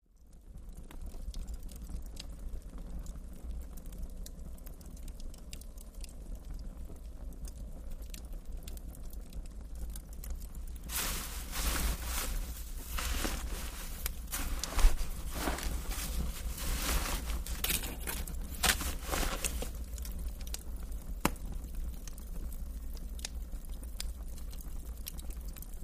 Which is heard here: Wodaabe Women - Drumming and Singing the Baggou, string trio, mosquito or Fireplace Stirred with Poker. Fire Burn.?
Fireplace Stirred with Poker. Fire Burn.